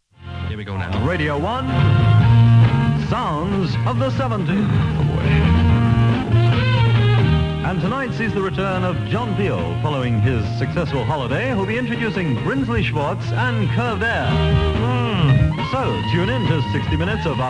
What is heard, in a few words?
drumm; peel 70